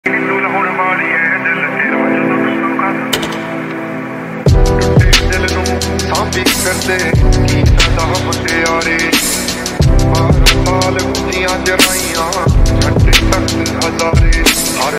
excuses ringtone

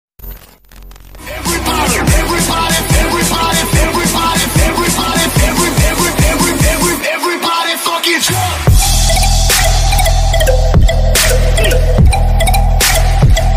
english ringtone edm

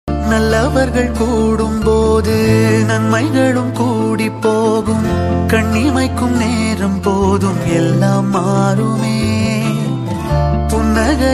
tamil song; ayothi movie

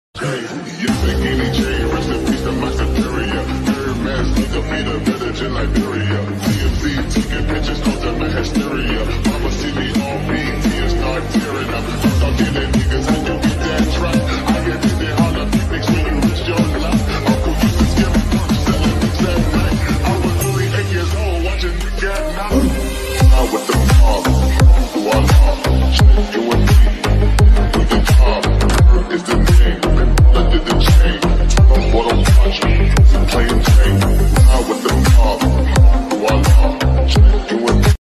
english ringtone attitude ringtone

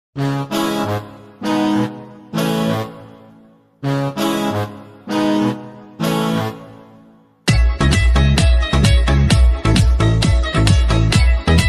remix ringtone instrumental ringtone

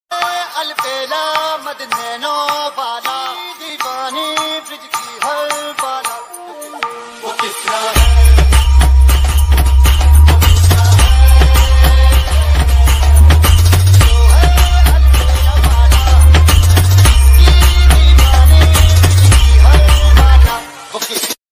flute ringtone

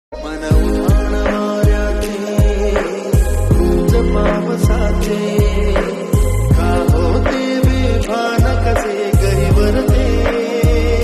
music ringtone

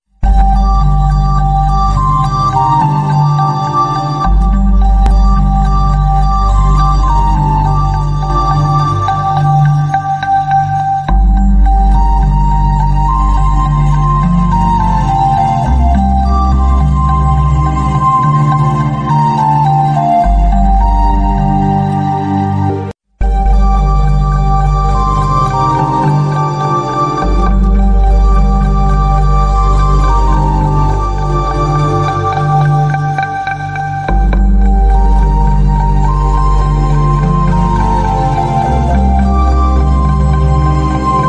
Telugu soothing ringtone; bheema movie

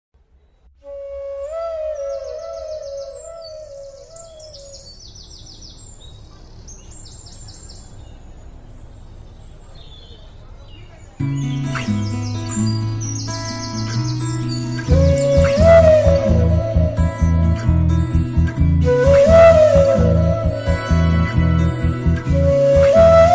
love breakup song bgm, broken bgm ringtone

